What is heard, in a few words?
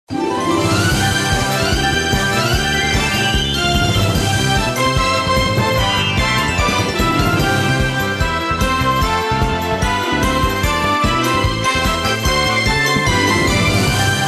Mario Party Theme